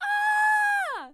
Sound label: surprise peak; VIVAE